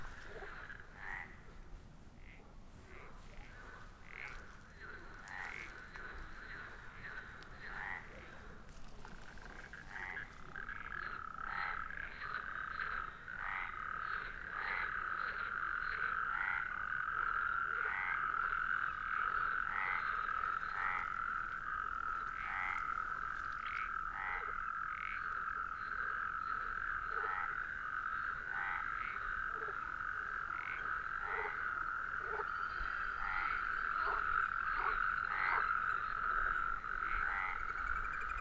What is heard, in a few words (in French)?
amphibiens lac tuilerie venerque selection concert